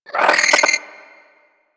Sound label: Hyena Vocal Angry; Vocals; Warframe; Hyena Micro